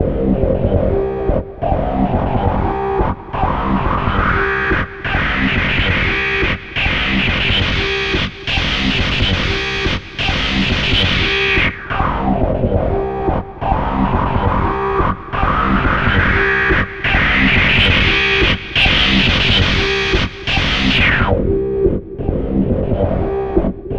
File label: El Distorto, Basses